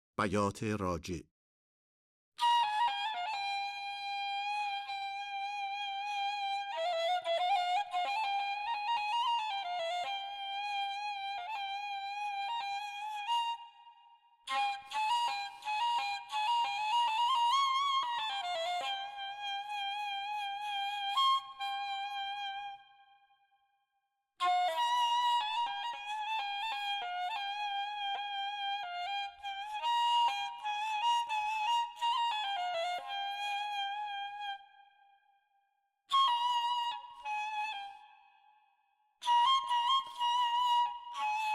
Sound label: Bayat-e Rajeh Isfahan Radif-e Ney; Avaz-e Isfahan